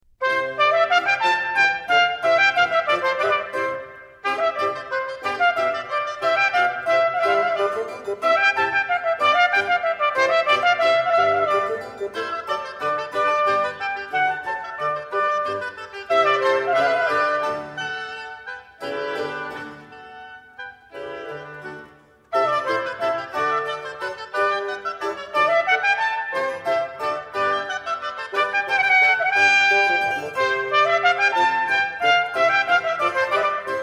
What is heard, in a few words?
Albinoni Trumpet Concerto in C Allegro